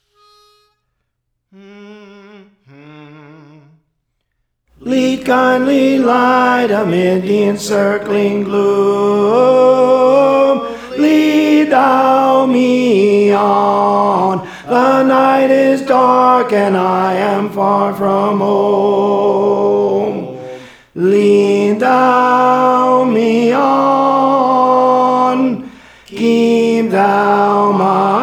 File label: Tenor; Praise For The Lord Training; Lead Kindly Light